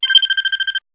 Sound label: Business Tweet, Business Rings